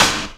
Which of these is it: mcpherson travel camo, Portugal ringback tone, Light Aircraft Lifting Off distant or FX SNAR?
FX SNAR